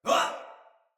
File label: Surprised Soldier Male; Battle; Barks; Dialog; Sinecure Soldier Male B